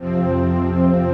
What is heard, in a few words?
CHRD PAD; Best Service; XX Large Pads; Sample CDs